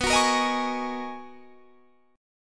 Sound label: B Major 13th B, Guitar, Standard; BM13; strummed; JGuitar